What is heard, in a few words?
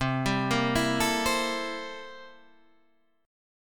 C 13th C down Guitar Half Step Down